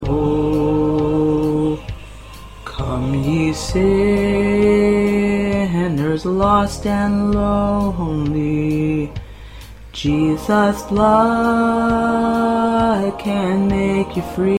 P Baritone

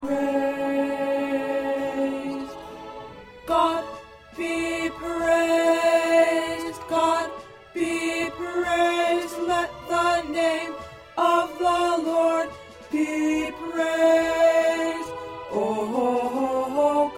Tenor

